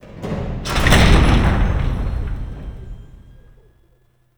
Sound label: Vault Door Massive Start; Warframe; Entrati